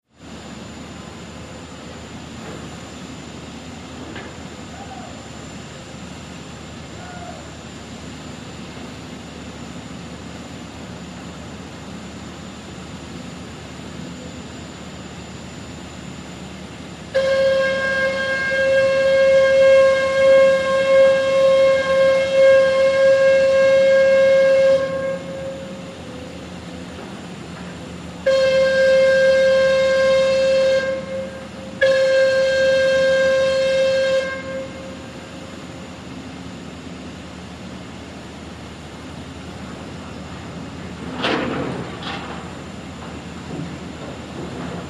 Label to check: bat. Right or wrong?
Wrong